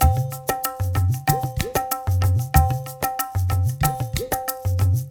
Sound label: 93 MISH, Misha Udu; Spectrasonics - Supreme Beats - World Dance